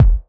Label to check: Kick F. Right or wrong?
Right